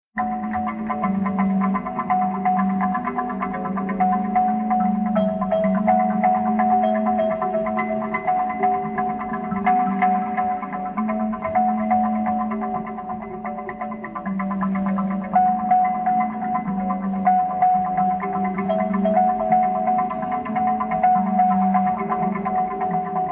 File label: Kalimba